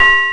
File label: Carnival Bell